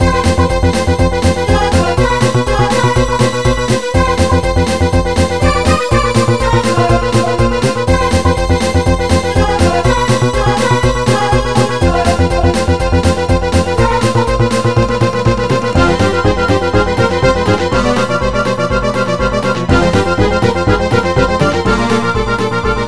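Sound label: background music level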